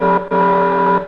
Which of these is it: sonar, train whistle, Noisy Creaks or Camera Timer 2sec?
train whistle